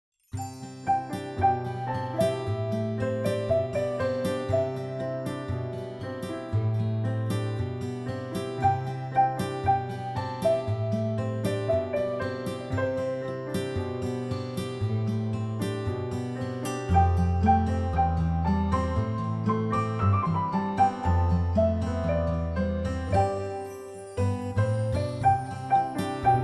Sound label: GTR